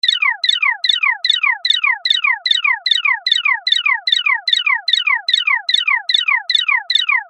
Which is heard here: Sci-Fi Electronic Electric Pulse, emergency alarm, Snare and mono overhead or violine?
emergency alarm